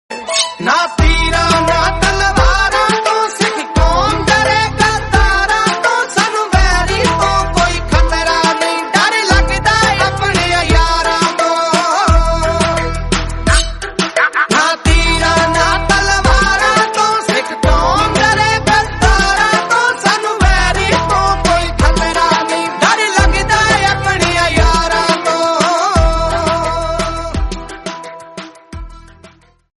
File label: gurbani shabad punjabi